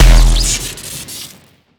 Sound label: shotgun shoot; tron mod; weapons; crit